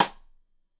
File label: Side Stick Stereo; Deeply Sampled Drums